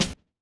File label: KIT, Dance Mega Drums, HIP; Best Service